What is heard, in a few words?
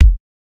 old school puffy steel kick drum f sharp key